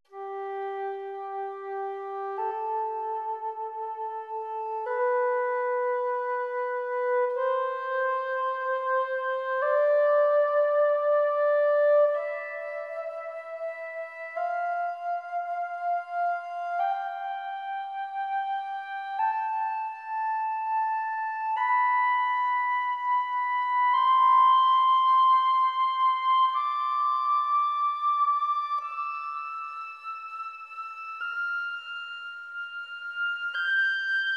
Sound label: test pitch dynamics